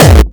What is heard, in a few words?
Kick; osu